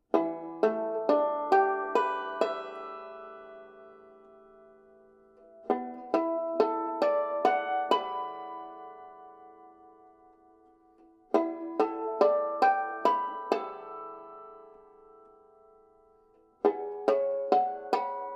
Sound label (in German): flagolett, banjo